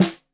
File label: Snare 65 Reg Stereo, Deeply Sampled Drums